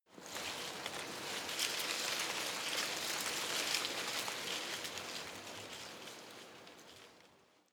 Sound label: tree small leaves rustle very soft